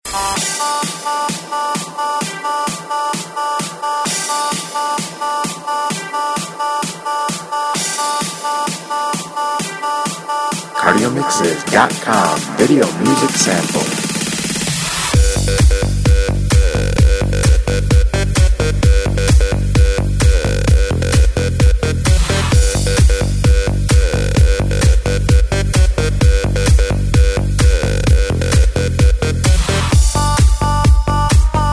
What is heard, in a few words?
Club Bang Anthems